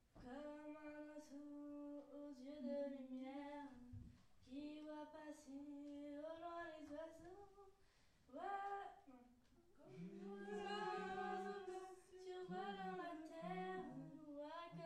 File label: Cabaret